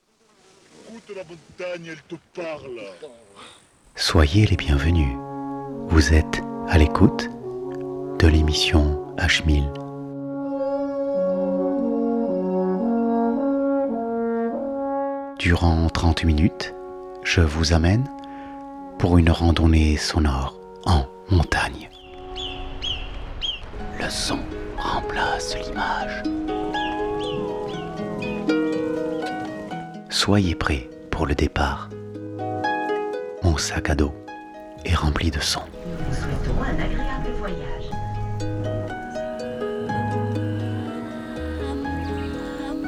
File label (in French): Speciale oiseau